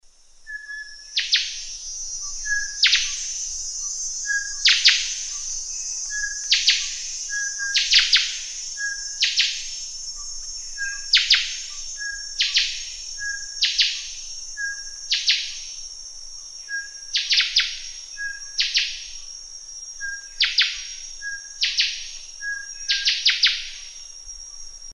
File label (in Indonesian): philentoma kerudung